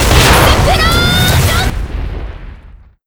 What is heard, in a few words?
tnt explode; weapons; firearms; megumin